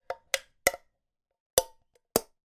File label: foley food tin can lid opened movements